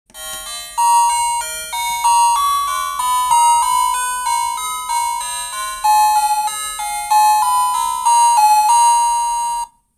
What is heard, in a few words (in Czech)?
melodie